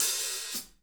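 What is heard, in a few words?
hat open close, drum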